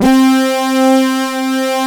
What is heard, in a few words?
T brass C2; AKAI S-Series CD-ROM Sound Library VOL-2